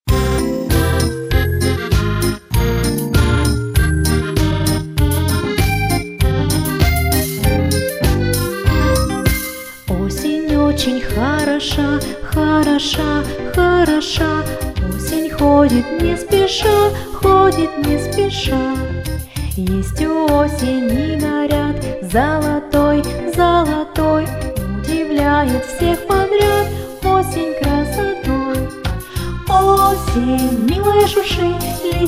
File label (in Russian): Detskie pesni pro osen